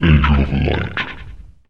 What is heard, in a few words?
potion light; angel